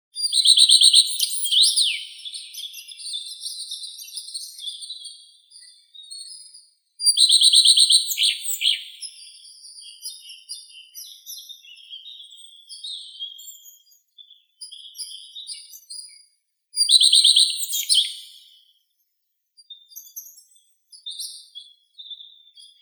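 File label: common redstart, Phoenicurus phoenicurus; gorihvostka obiknovennaya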